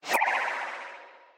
funny blunder

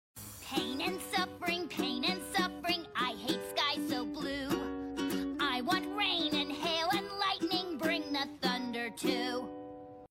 pain and suffering song; littlest pet shop

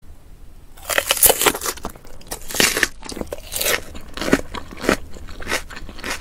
eating orange bellpeper asmr satisfying

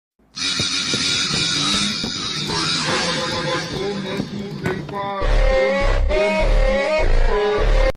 fence phonk

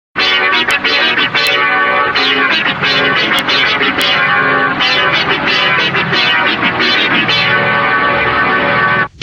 goskino ussr logo scary

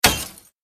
hammer

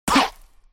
jump; shadow

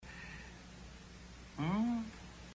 hum

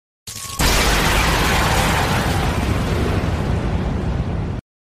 titan transformation sound effect

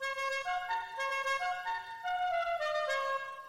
klaxon musical

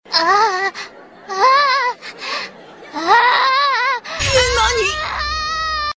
aaaaaaaaaaaah ayaya

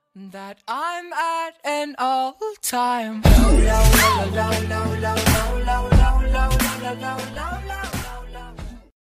follower sound; twitch

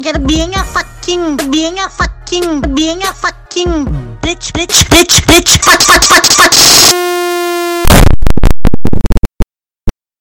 haha

